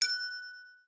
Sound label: Orders up; Google; Pixel-3